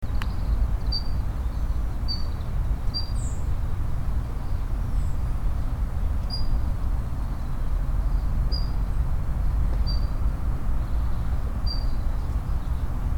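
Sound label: daurian redstart; jyoubitaki; hokubukouenn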